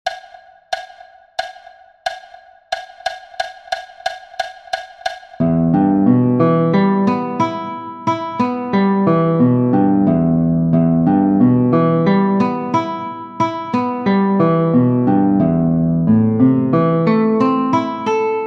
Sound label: Gypsy Gtr 90bpm